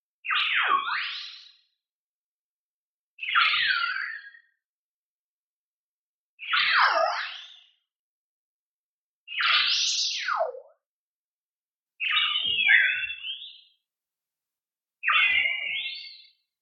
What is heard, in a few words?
TONAL Cloud Appear; boom library; Anime Essentials